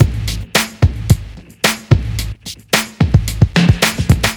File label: Noisy